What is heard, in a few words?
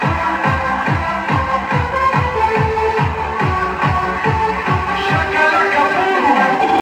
Kaiser Fun Box Shakalaka Boom